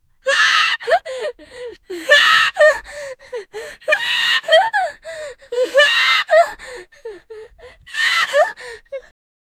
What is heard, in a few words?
a woman crying openly and